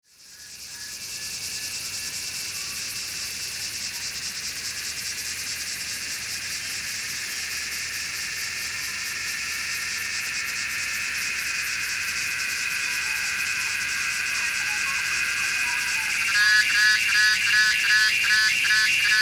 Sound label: Redeye